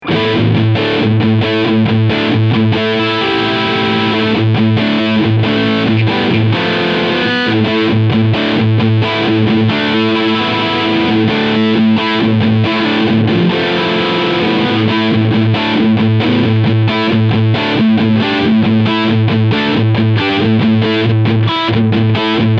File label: MXR Drive Blend Left Scoop ON